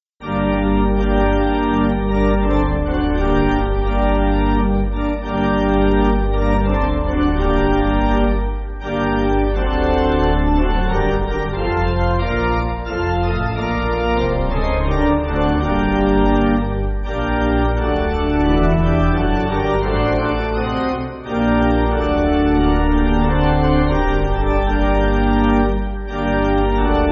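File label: Pipe Organ, church backing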